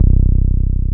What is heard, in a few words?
TB303, TB-303 BASS